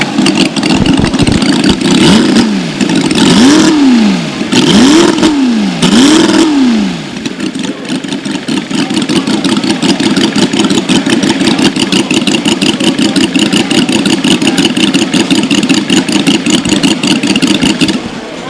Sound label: flathead8